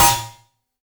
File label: Stab Crash; FREE SFX PACK VOL 2